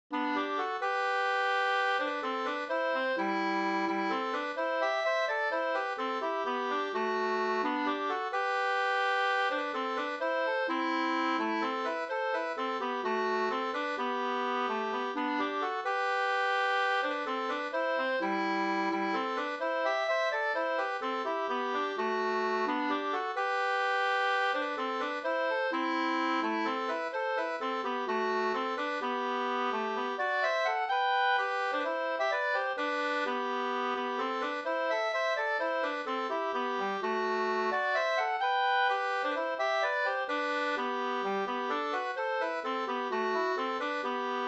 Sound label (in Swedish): brudmarsch; fr Norn Sater